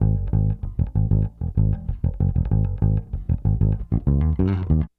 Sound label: Bass, 70s Analog Funk